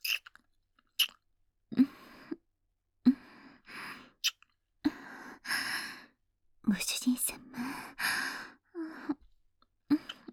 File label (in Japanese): キス